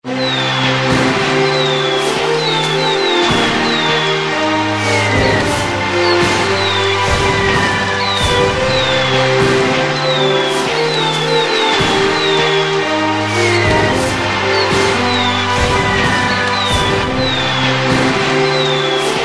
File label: Fireworks Fanfare